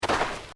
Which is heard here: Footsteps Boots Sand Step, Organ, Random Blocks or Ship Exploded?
Footsteps Boots Sand Step